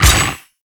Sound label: Melee Hit; Voruna